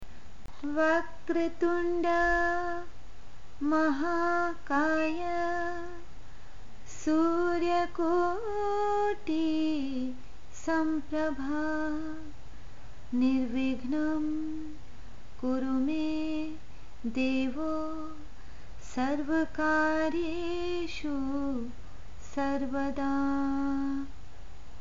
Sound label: Ganesh Mantra, Hindi